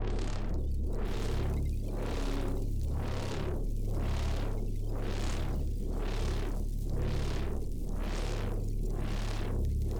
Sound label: Drone Gravitrone Loop; TANK; 3Souls